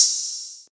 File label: wet open hi hat one shot F key, Royality free open hat one shot tuned to the F note